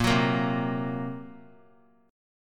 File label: Bb Suspended 2nd Sharp 5th; Bbsus2#5; strummed; JGuitar